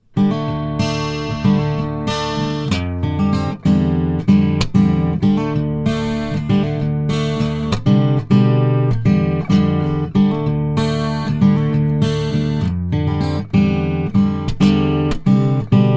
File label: Yamaha Transacoustic LS-TA Fingers Chorus, guitarra acustica